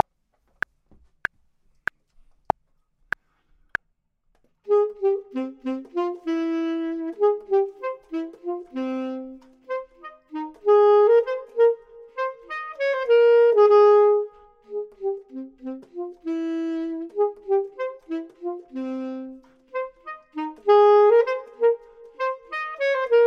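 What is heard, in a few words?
top, Saxophone and Clarinet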